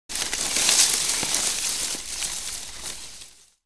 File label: Leaves Rustle; Environment